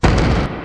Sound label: golem move; bradium golem